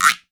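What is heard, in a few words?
LARGE L-P G; Voice Crystal - Steve Reid Definitive Percussion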